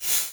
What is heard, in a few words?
Multimedia Internet CD-Rom Flash Wipe Short